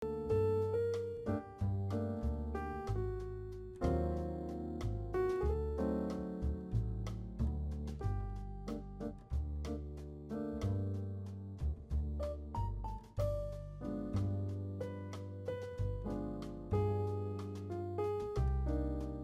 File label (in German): Surfermusik